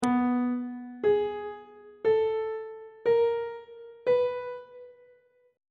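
c flat diminished 3rd minor 3rd major 3rd augmented 3rd intervals; on treble clef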